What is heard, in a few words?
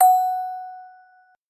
musicbox